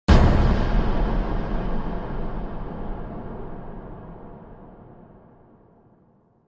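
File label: Impact Metallic Clang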